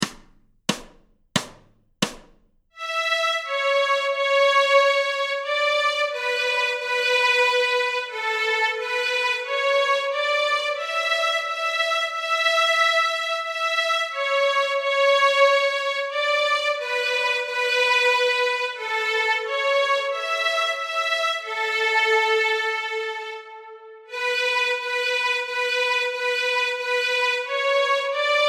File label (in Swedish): Stråk A, Fiol; Blir det inte sommar snart; Notträning